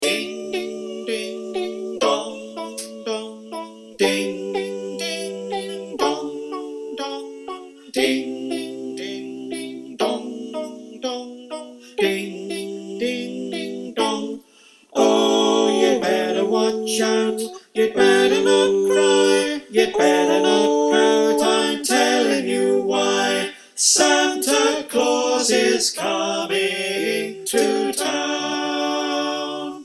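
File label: soprano alto tenor bass, choir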